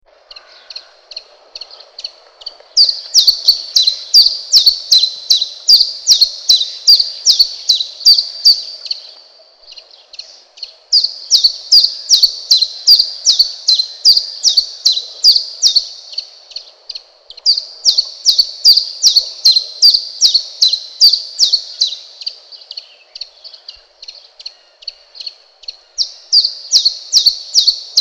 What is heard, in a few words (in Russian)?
пеночка кузнечик